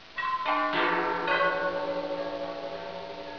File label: gong